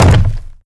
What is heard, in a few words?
land, footsteps, player, wood crate